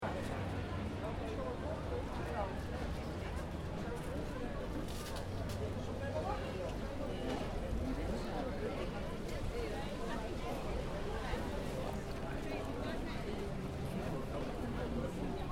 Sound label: Pedestrian Street Crowd, Crowd walla in foreign town pedestrian area; Indistinguishable voices, general crowd chatter